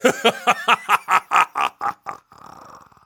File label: laugh; 傲之追猎者